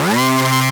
Synth, DnB One Shots

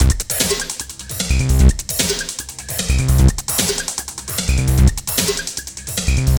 HEAVY, 150; Masterbits - Tekkno Trax; D LOOPS

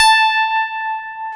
PIA DX E-PIANOS

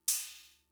Cymbal, Fricke MFB-522, Drum Machines

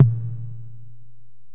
Korg x5, Drums

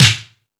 All Snares, Drum Machines

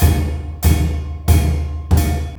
MALAY L, Heart of Asia

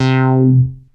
Bass Synths hits and octaves

